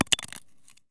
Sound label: grenade collision smoke russian; Escape from Tarkov